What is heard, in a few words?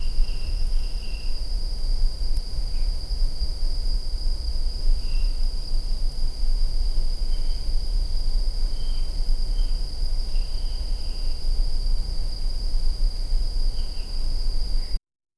base layer mountain night, environmental